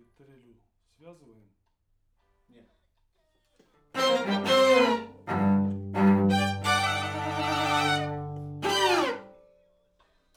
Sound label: Main Strings, RNR for piano